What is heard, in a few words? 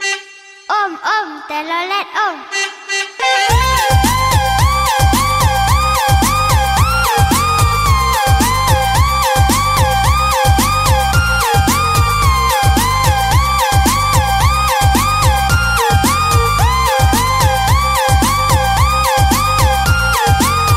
telolet tone ringtone